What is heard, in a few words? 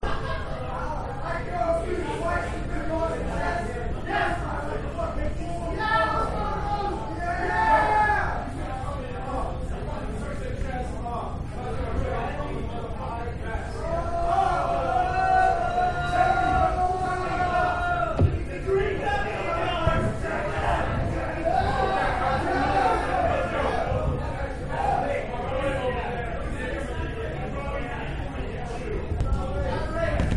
Outside Lowry on Friday Night